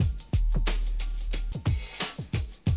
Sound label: Classic DrumLoops; Best Service; XXL 1500 Classic DrumLoops; Sample CDs